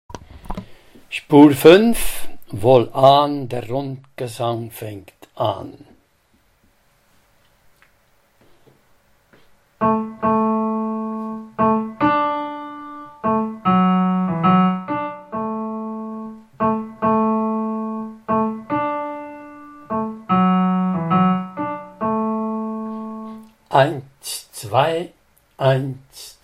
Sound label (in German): Bass; Wien